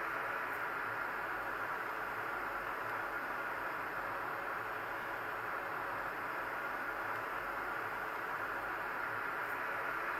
distant; q3rally

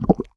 wpn move select

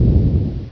quake end; ParoxysmII

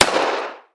shoot, weapons, colt; nzp

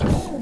rotate, weapons

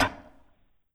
mg, weapons; nzp

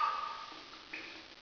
drip; ParoxysmII

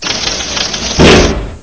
electric bolt; nzp

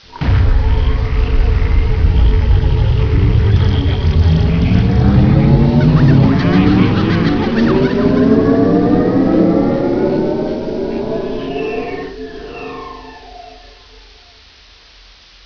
power, machines; nzp

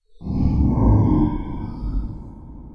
growl; q3rally; world

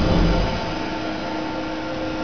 rack move, plats

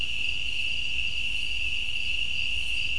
crickets, ambience; nzp

